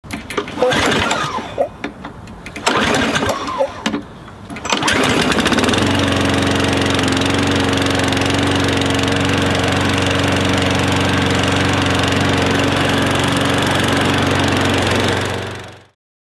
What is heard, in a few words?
Lawnmower